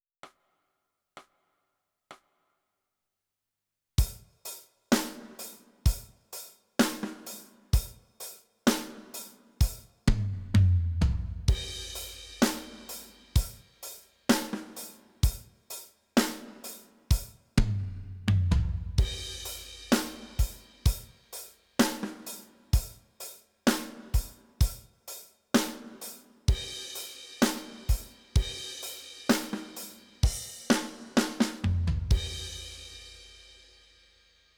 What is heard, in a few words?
Drums